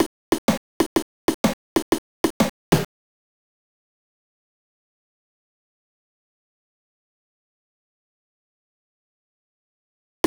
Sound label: Gameover; Steg the Slug; Amiga